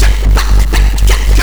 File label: VOX FX; Big Beat Samples & More